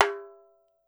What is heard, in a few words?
TAMBORIM, Steel Percussion; World Combos; E-MU Producer Series Vol. 7 – Old World Instruments